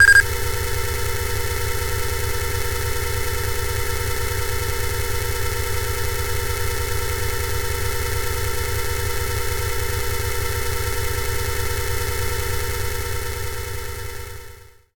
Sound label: noise radio signal; sonniss gdc